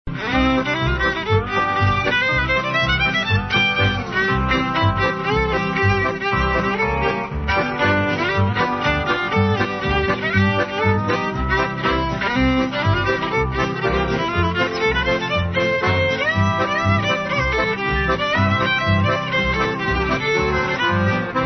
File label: Area Music; Big Thunder Mountain Railroad; Complete Loop